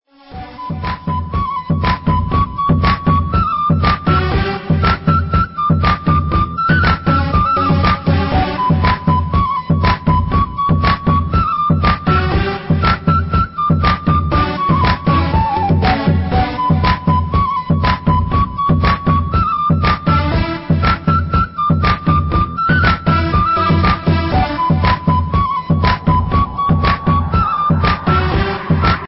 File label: Android Kitkat Ringtone